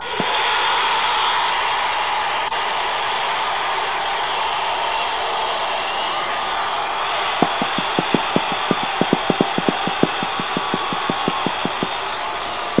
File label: palace score fanchants